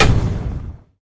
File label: buff combo; Thunder Fighter